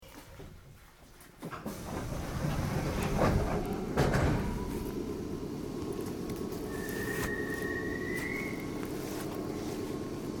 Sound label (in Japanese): 6600系, 走行音; 東大手〜栄町（瀬戸線普通）; 名鉄